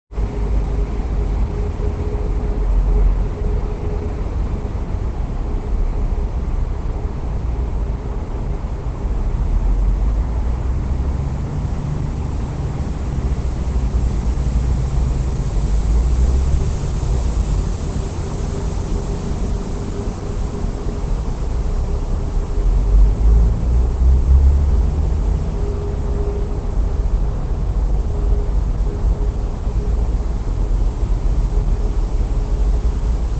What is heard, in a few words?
street ambience; Milk outside a bag of milk... OST (2021)